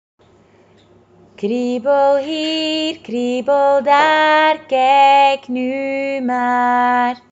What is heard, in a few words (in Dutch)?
kriebellied